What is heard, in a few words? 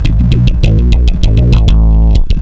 WHA DISTO B; Big Beat Samples & More